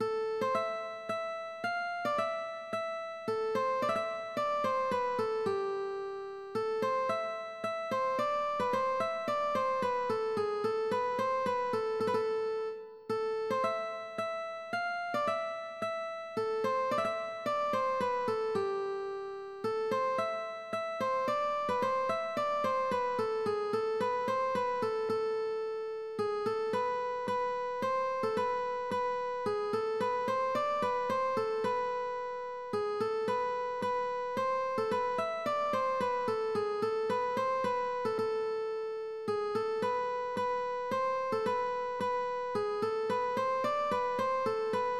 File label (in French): Mazurka